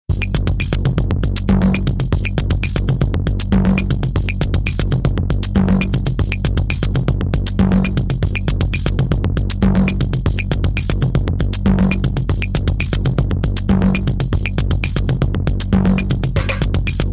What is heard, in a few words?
acid A